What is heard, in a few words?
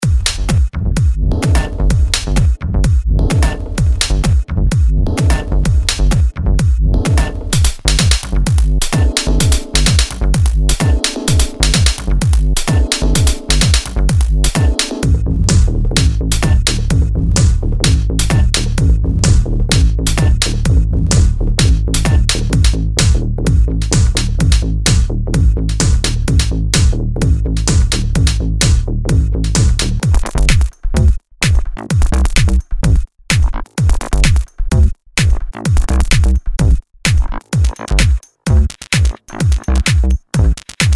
tech house tops; micropacks